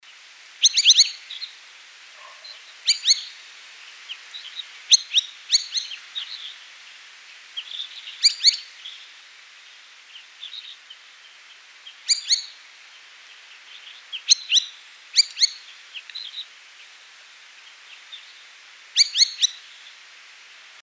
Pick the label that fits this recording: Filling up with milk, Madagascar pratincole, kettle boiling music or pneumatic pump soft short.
Madagascar pratincole